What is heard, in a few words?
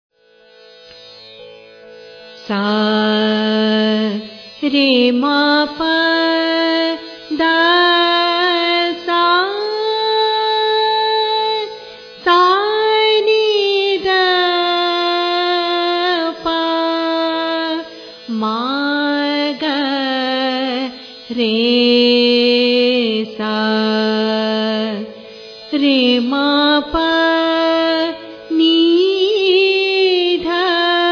asawari, raga